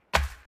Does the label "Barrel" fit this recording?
Yes